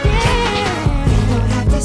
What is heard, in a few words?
yeah 9 notes